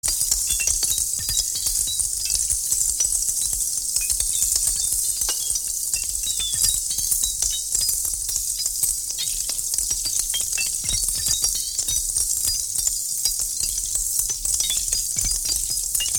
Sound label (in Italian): effetto spazializzazione stereofonica